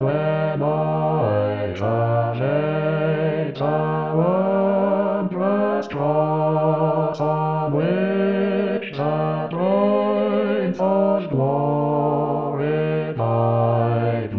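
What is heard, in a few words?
When I survey Bass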